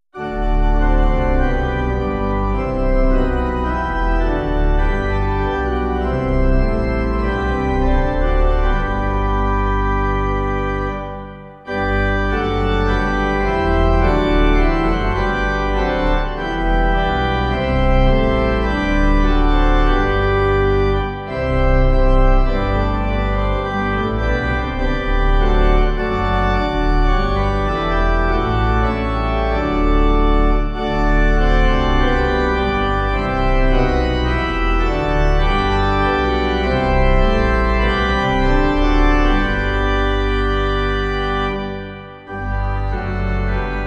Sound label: Organ, hymn; Lead us heavenly Father lead us; Mannheim; Hymnal 1982